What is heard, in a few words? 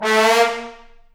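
trombone slide; Roland L-CDC-01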